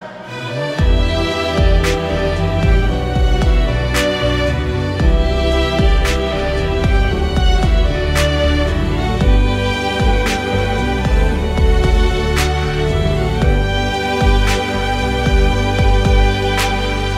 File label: Instrumental